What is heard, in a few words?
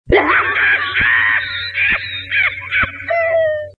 Hyena Laughing